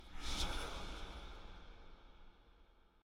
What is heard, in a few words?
Hotel Whisper; silent hill homecoming 2008 gamerip pc ps3 x360